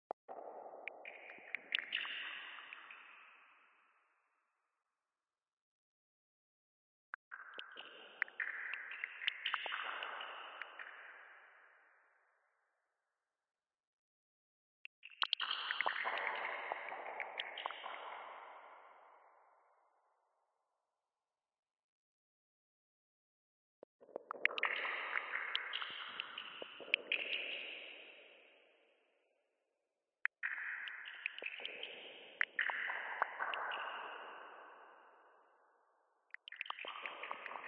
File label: int haunted sewers sparse dripping water drops echo reverb space